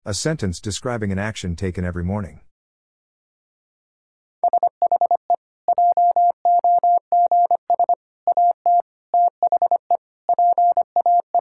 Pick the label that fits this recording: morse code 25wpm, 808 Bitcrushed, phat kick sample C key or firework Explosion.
morse code 25wpm